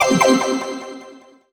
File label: win; rund um die welten